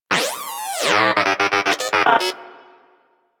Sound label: Moa Inquisitive Vocal Enemy Spotted; Warframe